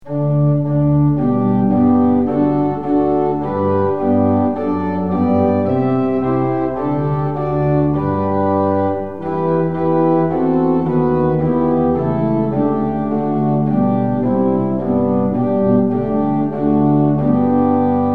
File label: tune; hymns; lillenas